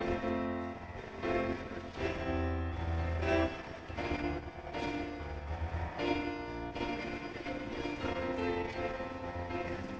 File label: no drums inst src; SiSEC08; SiSEC underdetermined